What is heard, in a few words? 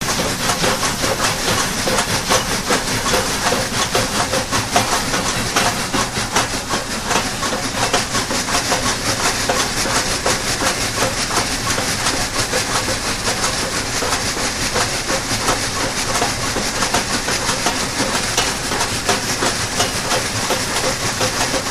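Challenger After Whistle, steam train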